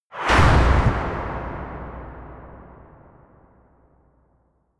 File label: approaching metal impact; hits explosionen